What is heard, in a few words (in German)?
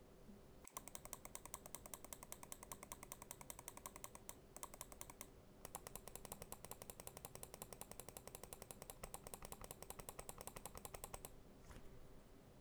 Linke und rechte Maustaste